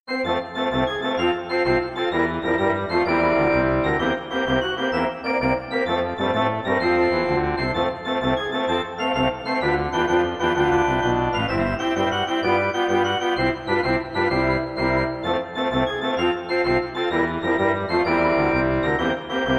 march

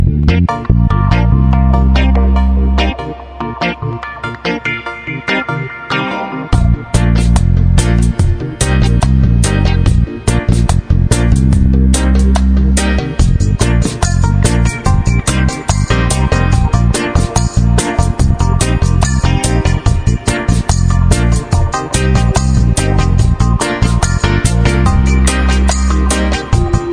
positive reggae

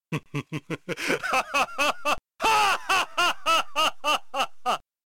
hahahaha; Iori

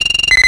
synth fx

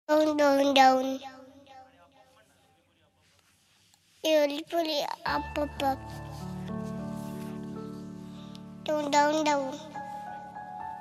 comedy baby

